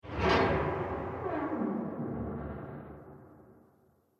Haunted House Door Open, Creepy Door Sound Effects